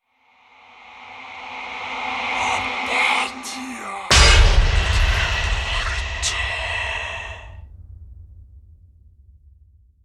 Creepy Cinematic Hit

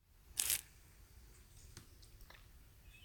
Unsticking

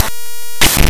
Error

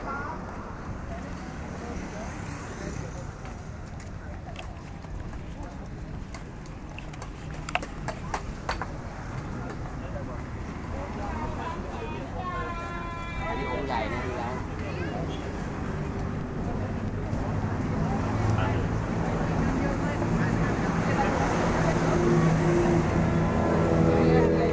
Bangkok market

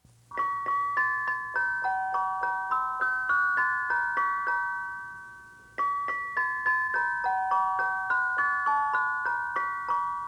Music Box

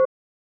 Beep

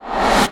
Swoosh

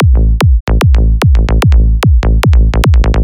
Psy Trance 148bpm A1 Kick Bass Loop Patterns

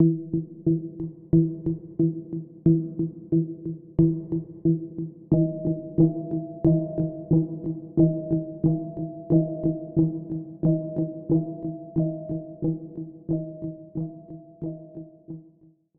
Thoughtful Moment

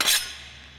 Sword Slice

